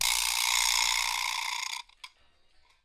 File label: Vibraslap, acoustic percussion loop; Drums & Percussion; Percussion Loops